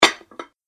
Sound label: Medium Sized Dinner Plates Stacking Noise